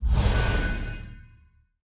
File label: magic